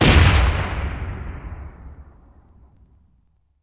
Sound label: Cannon Fire; Civ4